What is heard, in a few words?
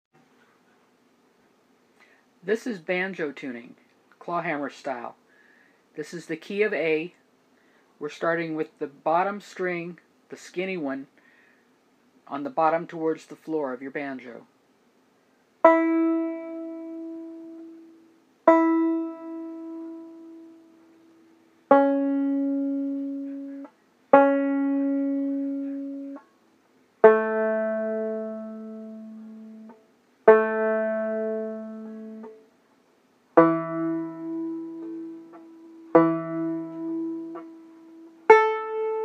Banjo Tuning Key of A